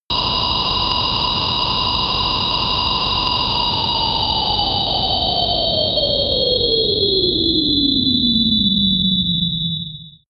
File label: eerie wind scream fall, drones; musikelemente